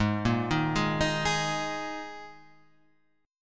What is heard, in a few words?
Ab Suspended 2nd Guitar Half Step Down